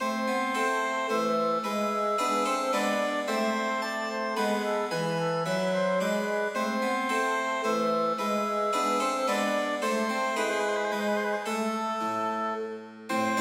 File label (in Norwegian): Menuet for obo, fagott og piano; Aamodt